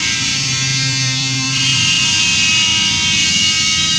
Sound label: Velvet Guitar, Strings; Sonic Foundry (Sony Creative Software); Myths of Technology